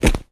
footstep dry forest floor; foley

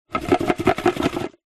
food tin biscuits shake; zapsplat

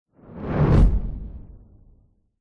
sound design whoosh flames fire cinematic; zapsplat

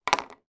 household hair brush put down on table

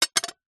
drinking glass down on glass surface; household; zapsplat

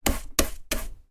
household brush small brushing wooden pine table

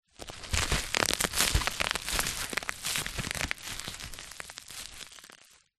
bubble wrap crumple handling; foley

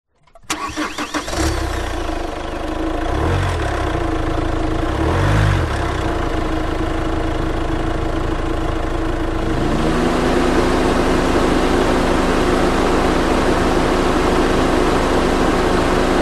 vehicle car suv engine on idle engine fan on off

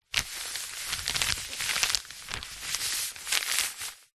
wrapping paper rub smooth out with hand

